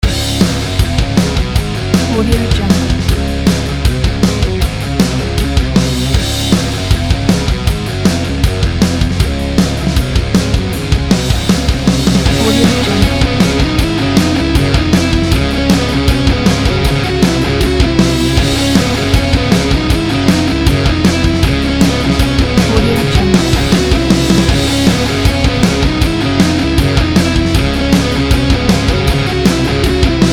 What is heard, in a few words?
driving grooves